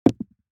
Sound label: DICE on Felt, Throw and Roll, Metal, 2 Two Dice; Samples; ArticulatedSounds; DICE Sfx Party (Extended)